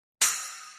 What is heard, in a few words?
HHX Evolution Splash, Hit; sabian splash7 brilliant finish